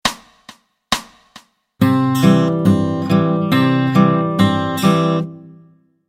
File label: Travis Picking